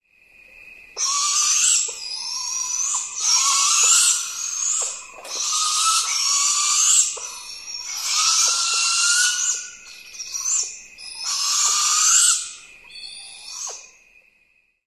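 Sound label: immature begging calls, owl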